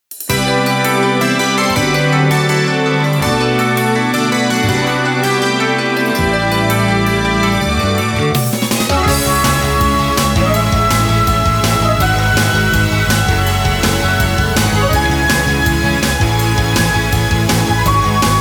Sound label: instrumental; Sen-Goku Hime Senran ni Mau Otometachi Soundtrack